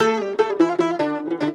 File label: Loop